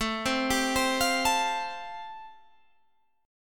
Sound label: A-Minor 7th Sharp 5th, Guitar Open G, chord